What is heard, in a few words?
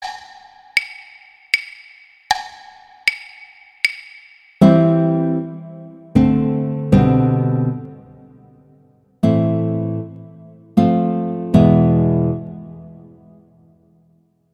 Introduzione Violin; Paganini; Sonatas Op1 Vl Gu; Sonata No 4